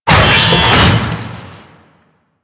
Necramech Ranged Hit React; Warframe

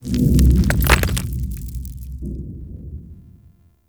Town Stone Debris, Ambience; Warframe; Duviri

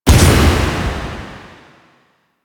Psychic Bolts Attach; Warframe; Nyx

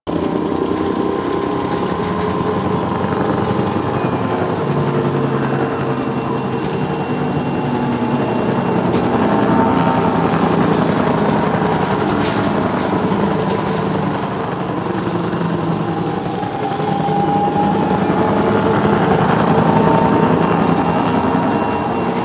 Skiff Engine Loop Slow, Vehicles; Grineer; Player Vehicle; Warframe

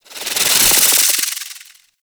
Slinky Movement Rattle; Warframe; Man In The Wall

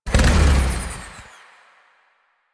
Boost Stop, Motorcycle; Motorcycle Ninety Nine

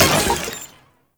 Infested Spore Pistol Low Clip; Weapons

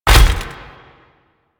Melee Jump; Warframe; Necramech

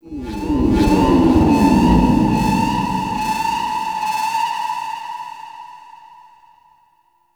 Sentient Spawn Call, Enemies; Sentient Movement

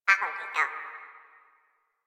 flying robot voice; Warframe; Quests; Veilbreaker; Ambience; Kahl Quest

